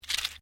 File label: RATTLE SEEDPOD SHORT, Musical rattle made from seedpods